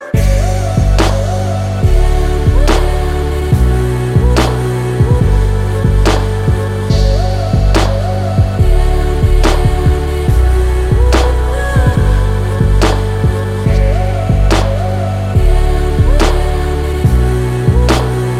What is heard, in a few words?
Chillwave Ringtone